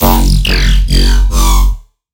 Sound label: Riddim Bass Hit F#; Squirm